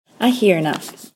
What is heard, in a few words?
Irish Mass Kyrie